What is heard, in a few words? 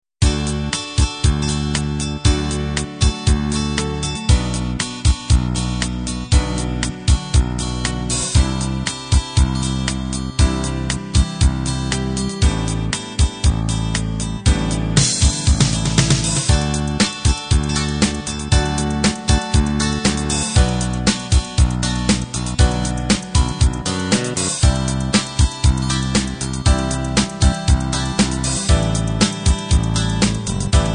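pop 16 beat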